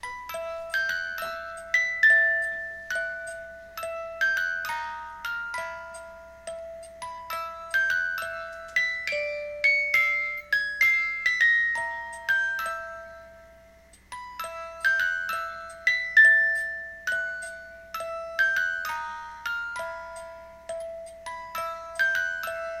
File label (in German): Spieluhr; Harry Potter